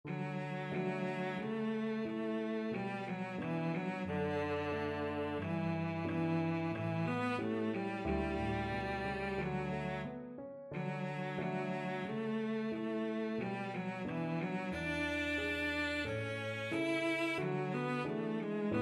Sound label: an den mond, cello